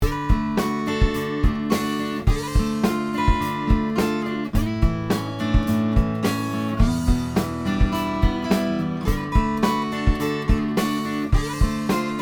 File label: MXL R144 50cm